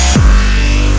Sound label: bwoing